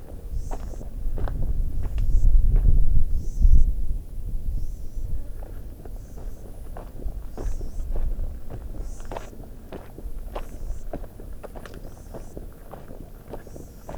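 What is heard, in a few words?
Australia Western Australia Gudanga boulayi; WA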